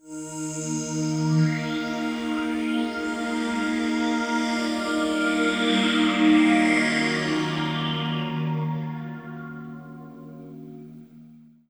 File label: PAD, Hip Hop and R&B; Best Service; ProSamples vol.01; AKAI